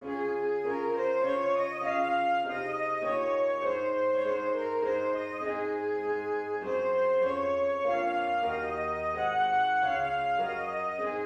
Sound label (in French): valse ra majeur; beethoven ludwig van